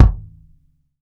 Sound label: FLAT, KICK DRUM; Sampleheads; New York City Drumworks VOL-1